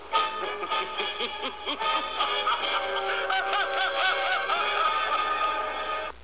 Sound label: laugh; nephrite